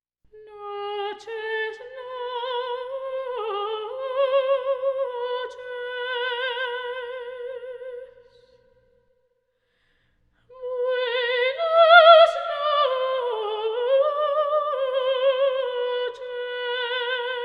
Vocal

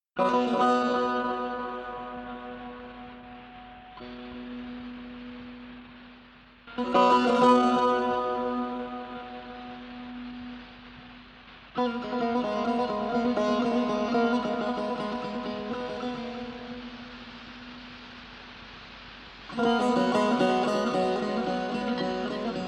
Improvisation In Mahoor

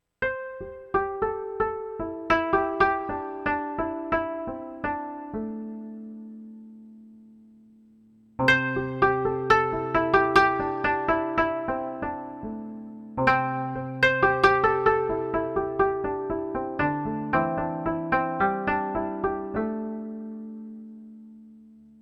Asian Zen Spa Music Meditation Relax

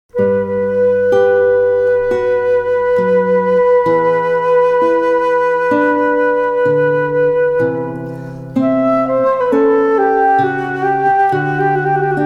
Air On A G String

